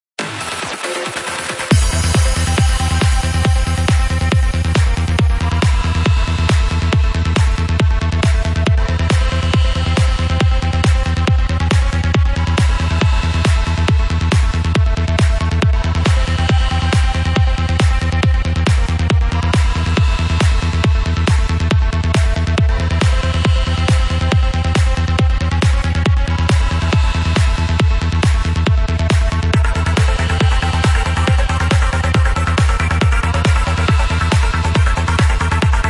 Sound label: progressive psy kits; DMS